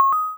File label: eat; snake